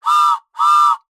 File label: train whistle double; party pack; Sonniss GDC